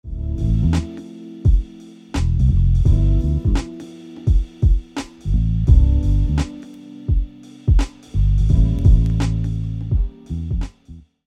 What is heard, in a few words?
2 5 1 6 progression, chord progression for chord tones examples, guitar; D minor 7 (9), G 7 (13), C Major 7 (9), and A 7 (b9)